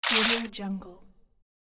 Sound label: ripping paper